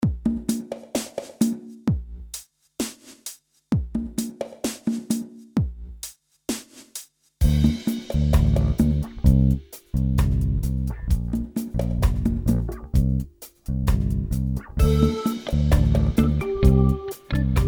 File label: reggae